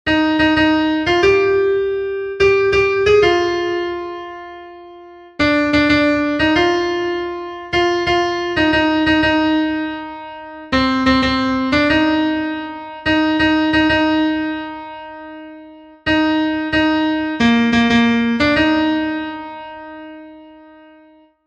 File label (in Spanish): CONTRALTO, himnos en piano; cantos del camino